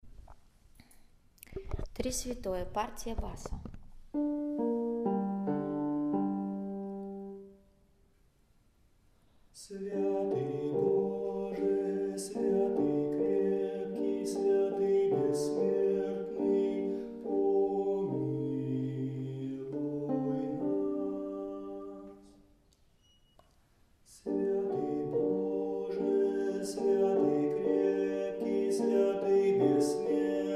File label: bas; Trisvjatoe